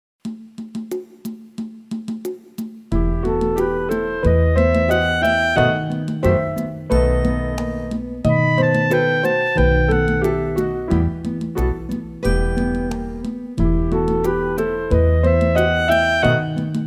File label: E Minor Scale Study Slow